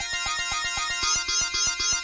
117 infestation synthline; SYNTH AND ELECTRONIC LOOPS

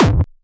nasty stormfront; Nasty Stormfront Electro FX

